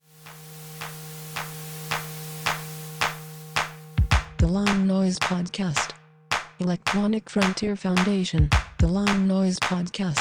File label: line noise